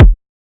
drum kick knock; Virtual Riot; Drum Hits

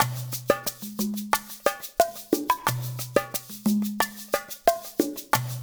LOOP, Percussion; InVision Interactive; GRUNT

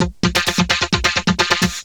Vocobeat; Sonic Foundry (Sony Creative Software)

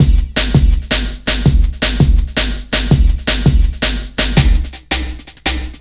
165, Drum Loops, Drum & Bass

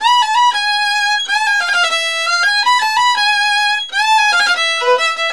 VIOL, Balkanish Traditions; USB Soundscan vol.63; AKAI

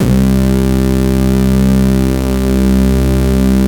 Big Lo Pcm C1, Synth Hits; Zero-G; Soundclash Nu Dance Electro; Acidized

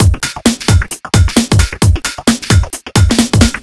132 Fill, Drum Loops; Zero-G; Soundclash Nu Dance Electro; Acidized Wavs; 132bpm

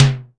SIMMONS SD-5 drums

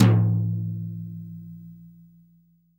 TOM, REAL DRUMS, SWING KIT

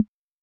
Bongo, Acetone Rhythm-King

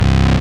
Single Acoustic Basses; Best Service; Dance Floor Bass

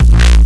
Bass; neuro; InsideInfo

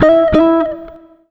120 JAZZ, Electric & Acoustic Guitar Loops; USB Soundscan vol.04; AKAI

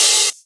Open HH, Cymbals

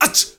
MALE SOLO, MALE NOISE, Heart of Africa

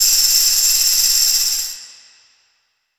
Tambourine Roll; Essential Percussion

